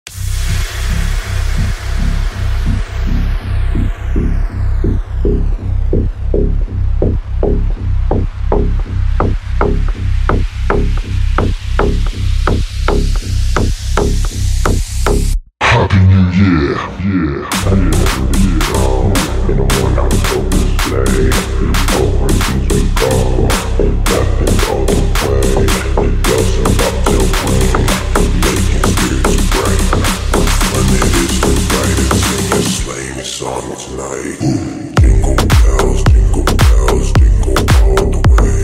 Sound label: JINGLE BELLS; remix